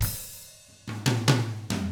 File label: Double Platinum Drums, COUNTY